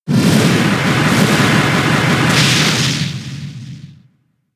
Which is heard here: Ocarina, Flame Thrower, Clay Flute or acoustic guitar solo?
Flame Thrower